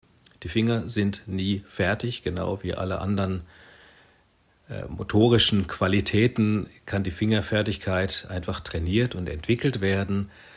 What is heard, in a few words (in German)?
klavier